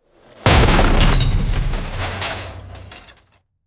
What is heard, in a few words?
epic cinematic crash and